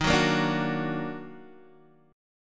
Eb Minor Major 7th Eb down Guitar Open D, chord

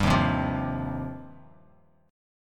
Eb 6th Add 9th, Bass Standard; Eb6add9 strummed; 15,6,10,10; JGuitar

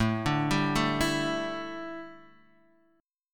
A 7th Suspended 4th Guitar Open D, chord

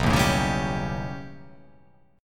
B Augmented 9th B, chord

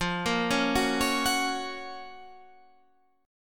Gb Major 7th F, guitar

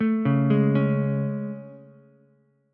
A Major 7th A, Ukulele; AM7 arpeggiated; JGuitar

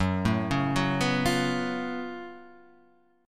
F sharp Minor Major 11th, Guitar, Half Step Down

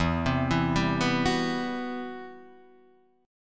C Major Sharp 11th E

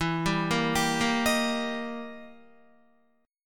E Minor Major 7th Flat 5th, E, down, Guitar

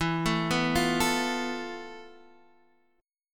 F Major 7th Flat 5th E, guitar chord

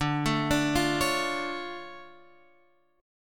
D Minor Major 7th D down Guitar Open F; DmM7 arpeggiated; JGuitar

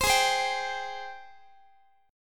G sharp Major 7th, Guitar; strummed; x,x,15,18,16,18; 4A,4E,5A,5D,5G#,6C#; G#M7

